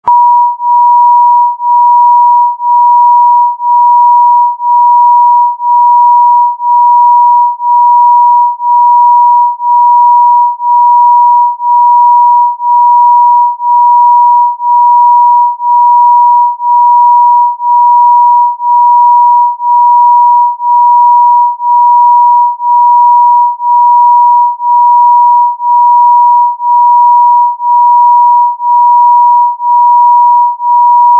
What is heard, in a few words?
tone 963 964 hz, Solfeggio tone; Certain tones and frequencies have been used to balance and bring harmony to our lives.